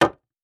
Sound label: Wood; Survival Game Sounds - Lite Edition; Environment